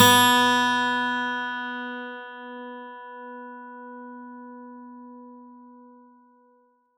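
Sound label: Plucked steel string B3; Acoustic and Electric; Polyend; Simulacrum Sounds; 1 Shots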